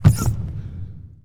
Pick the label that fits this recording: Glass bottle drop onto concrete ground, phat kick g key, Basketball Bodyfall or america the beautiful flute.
Basketball Bodyfall